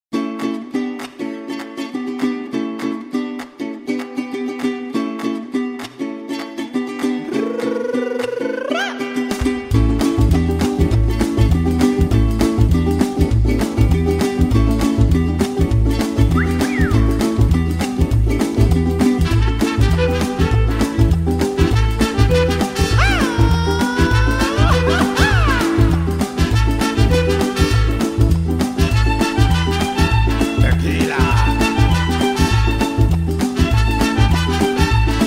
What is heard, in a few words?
Mexican Mariachi; The Mexican Mariachi